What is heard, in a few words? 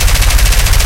vickers k loop left, guns